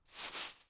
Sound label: leaf; gmod